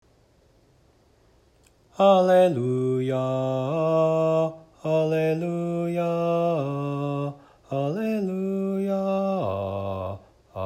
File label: Alleluia Bass